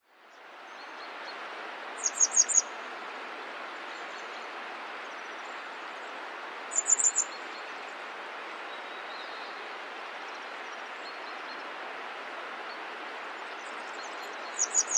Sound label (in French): Bergeronnette des ruisseaux Motacilla cinerea; Natura 2000